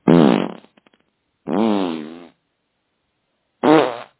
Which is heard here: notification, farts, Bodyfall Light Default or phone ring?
farts